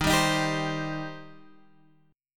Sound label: G Suspended 4th Sharp 5th D sharp